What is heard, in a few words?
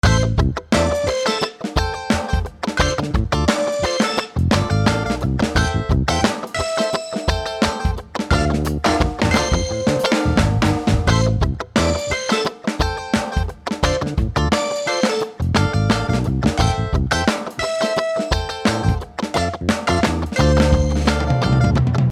Funky Silent Night